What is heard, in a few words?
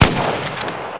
Gun Sound; Gangstar; Gully Loops